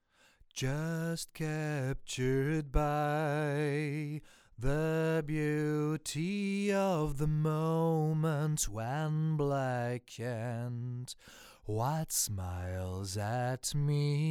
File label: dry vox; tbone sct 800